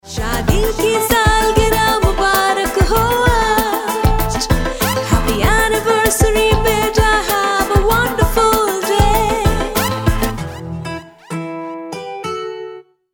ANNIVERSARY TUNE PARENTS TO CHILDREN HINDI FEMALE

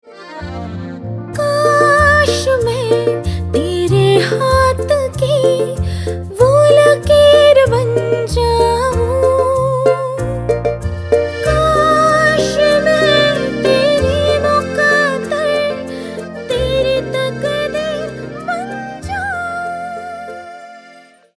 love day song Hindi female

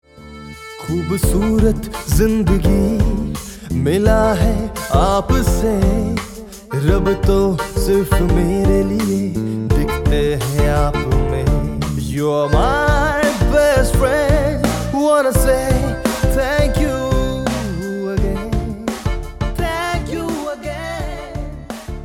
THANK YOU HINDI MALE KIDS TO PARENTS

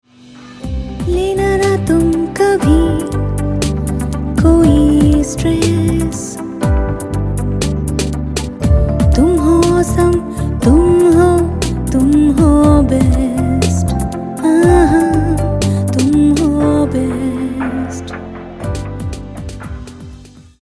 BEST WISHES TUNE FEMALE

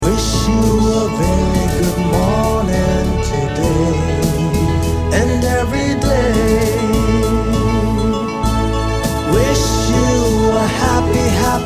GOODMORNING ENGLISH SONG MALE

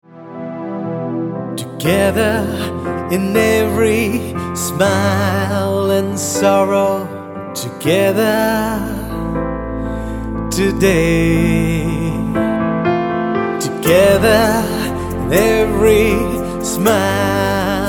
WEDDING WISHING SONG ENGLISH MALE

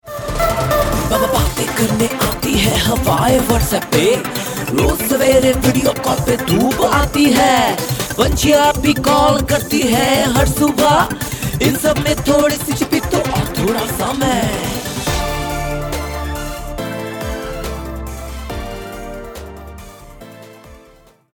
love day song Hindi male

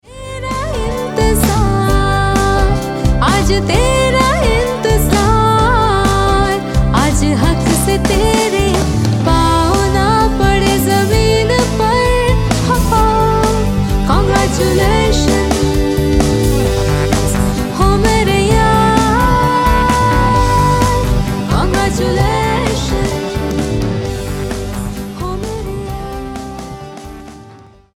CONGRATS FEMALE HINDI

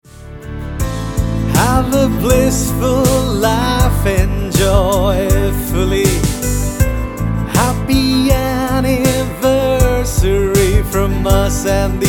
ANNIVERSARY TUNE PARENTS TO KIDS ENGLISH MALE

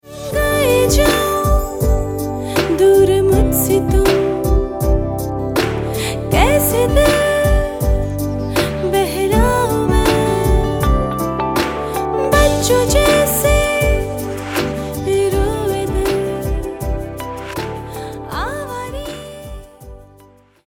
Hindi female

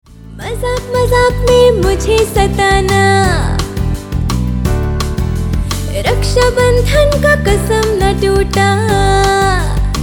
female Hindi

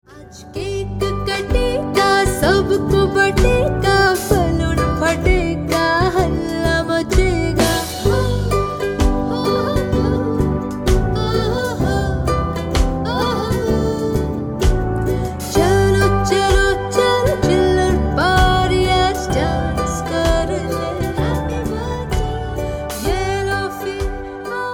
HAPPY BIRTHDAY TUNE MOM TO CHILDREN HINDI FEMALE

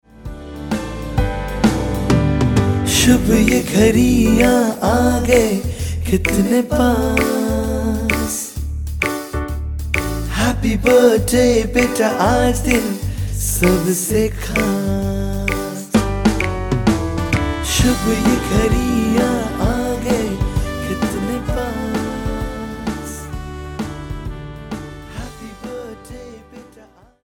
HAPPY BIRTHDAY TUNE DAD TO CHILDREN HINDI MALE

